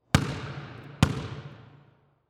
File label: Sports Basketball Dribble In Gym Short